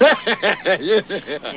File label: laugh